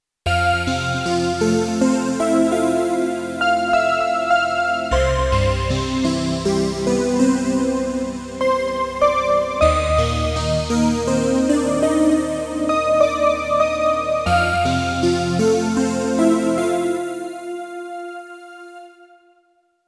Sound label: synth vox; micromonsta2